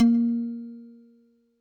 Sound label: Button General; netent